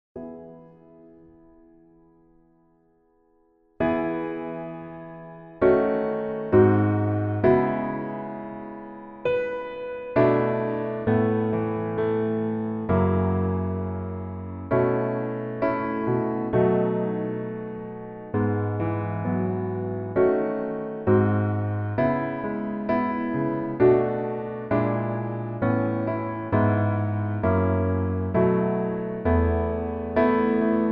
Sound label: Piano MR